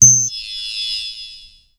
Electronic Wave Bounced

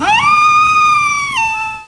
Wolf pup howl

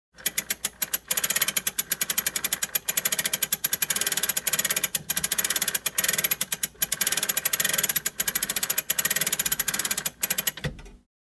Clock gear fixing

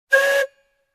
Short Train Horn Whistle

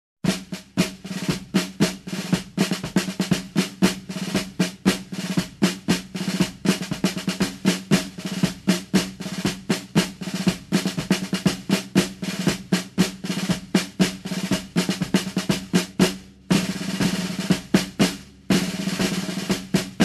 Military marching snare rhythm

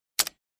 Clicky Button press

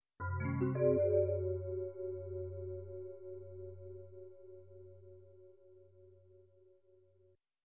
Weird and mysterious mallet sound effect

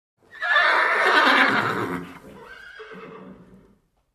Horse Whinny

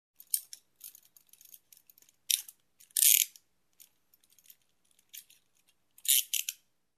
Handcuffs click and lock close

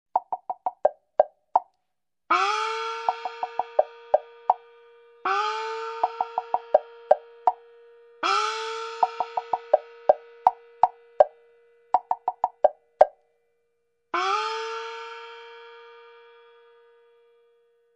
Woodblock chinese melody extended

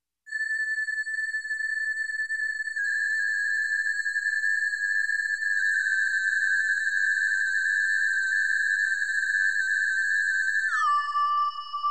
Mystic sci-fi synth

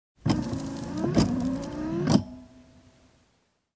Car Windshield Wiper

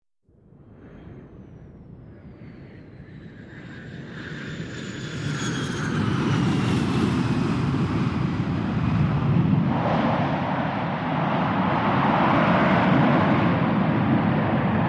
Aircraft flying over with whirring sound

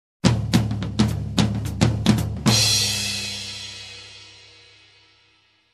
Jazzy tom groove

